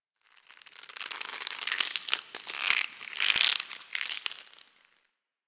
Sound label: Tendon Stretch Medium Close; Ambience; DE; Warframe; Infested Eris